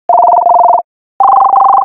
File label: Reminder